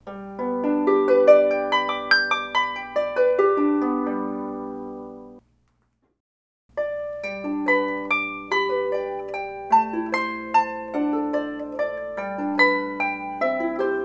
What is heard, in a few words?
Harp 22 string